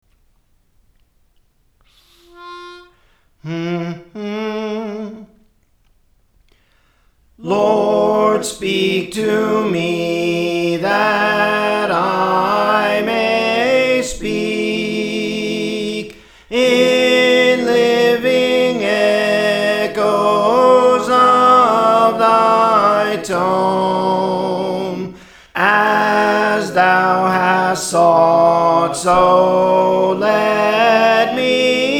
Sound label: Soprano; Praise For The Lord Training; Lord Speak to Me